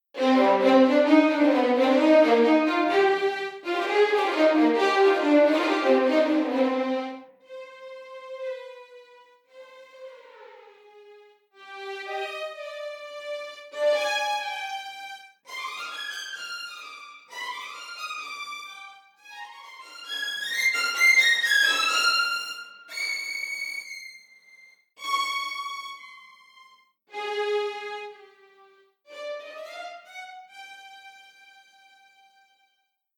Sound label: First Violins Test All Mics; LSS